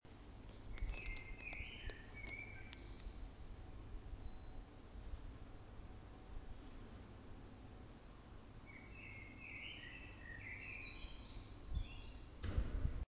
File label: birds backyard